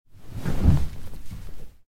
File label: Bed Up